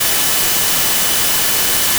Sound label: additive cello single; euclidean